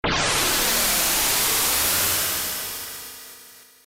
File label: machine ray short; ff3us